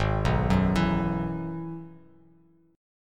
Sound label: G sharp Minor 7th Sharp 5th G sharp down Bass Standard; G#m7#5 arpeggiated; JGuitar